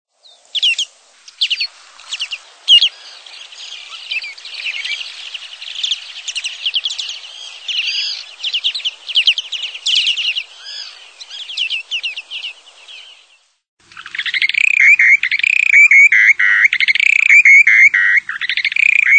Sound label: Short-billed Dowitcher; Birds